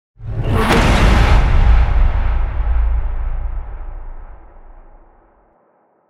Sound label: evil noise stinger